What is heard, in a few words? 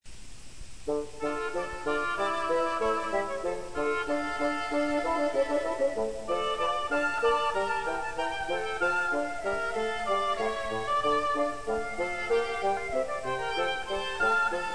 Bassoon Trio